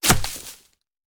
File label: Bullet Impact; Sound Ideas; Guns